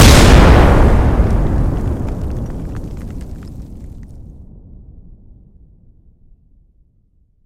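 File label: sudden single fierce explosion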